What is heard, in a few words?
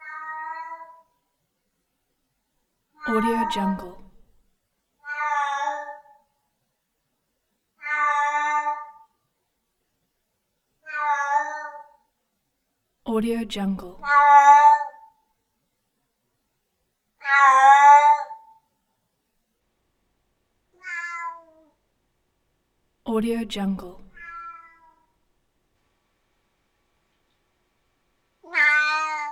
cat siamese meows irritating